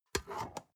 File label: storage lid slide close soft, box; objekte